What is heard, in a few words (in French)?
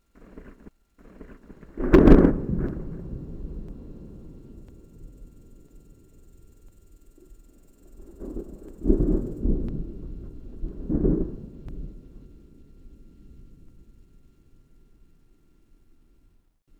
orage son; CD-ROM ODETTE; Odette Toulemonde